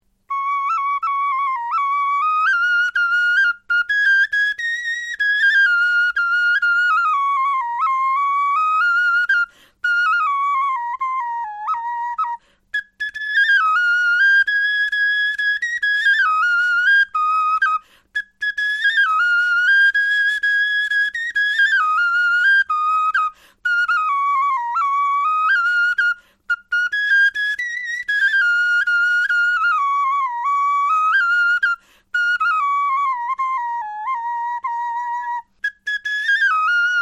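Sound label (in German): melodie, Knochenflöten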